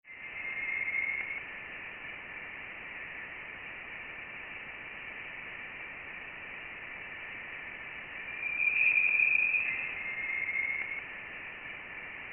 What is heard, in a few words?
tuning fork scene